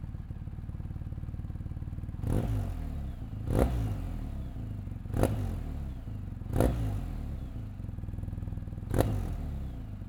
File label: Dynamic Mode, BMW; BMWGS2022